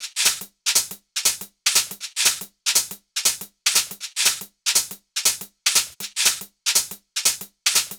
Hh Sh Loop 120; Hh-Shake Loops 120; Equipped Music; Loungin House

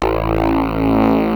dance, bass; Ueberschall; Techno Trance Essentials

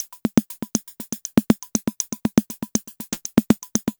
Percussive

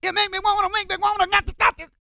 SING, Vocal Planet R&B & Dance; ILIO

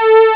string; ST-XX Amiga Soundtracker Sample Packs

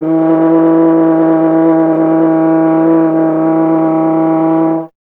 F. Horn; Miroslav Vitous; Solo Instruments VOL-2

